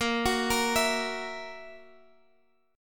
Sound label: Gb 7th Bb, chord